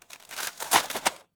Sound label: tents remove